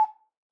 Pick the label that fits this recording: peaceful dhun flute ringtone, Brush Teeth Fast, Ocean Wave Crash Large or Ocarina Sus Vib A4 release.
Ocarina Sus Vib A4 release